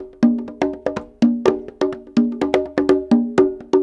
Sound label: PERCLOOP ETHNO 125; eJay